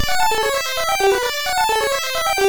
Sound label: Textile, MOS6581R3